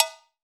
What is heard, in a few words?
COW, Hip Hop; InVision Interactive; 40 Oz Phat Slammin Hip Hop